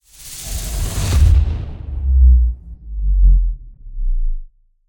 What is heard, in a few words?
Sub Sonic Bass